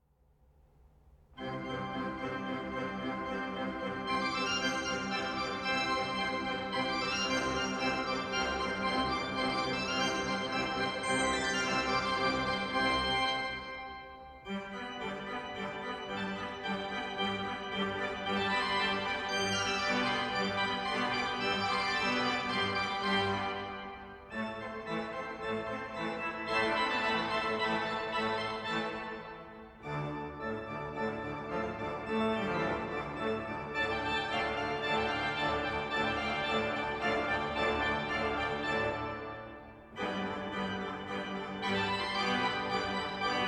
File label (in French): Improvisation orgue a 4 mains